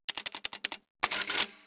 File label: adding machine